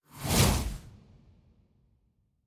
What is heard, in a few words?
Arcane Spell, Magic Sounds; Fantasy Sounds Bundle